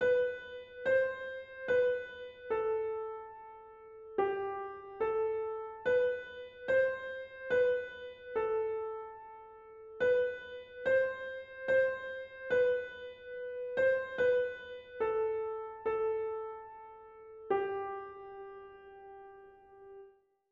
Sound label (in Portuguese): Melodia; la Motte